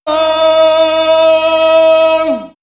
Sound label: Ethno Vox Islamic Prayer; vox mix; X-Static Goldmine